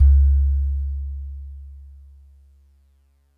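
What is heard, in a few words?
DreamLike DX100 C sharp 0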